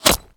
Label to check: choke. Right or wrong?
Wrong